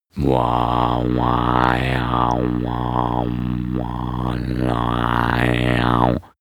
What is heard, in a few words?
male throat singing syllable bass